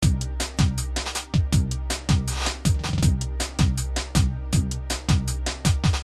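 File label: drum and bass; Drum&Bass; DJ Beats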